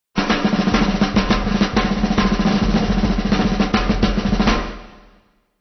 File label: fills jazz